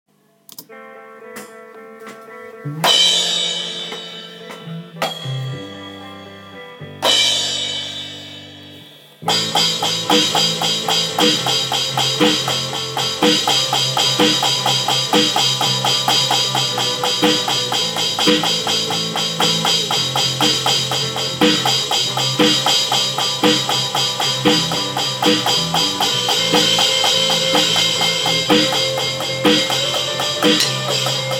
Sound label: 4/4 rock beat live